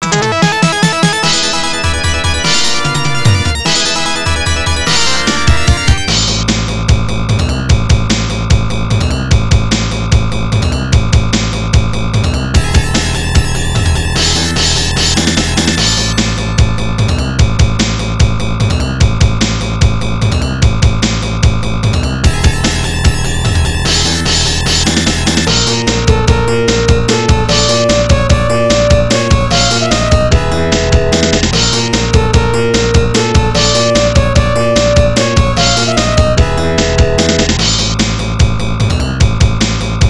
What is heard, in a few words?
Boss fight; Phalanx; X68000